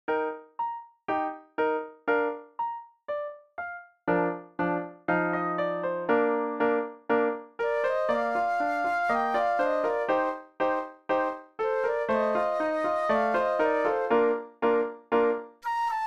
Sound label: Flute; Grade 9 Solos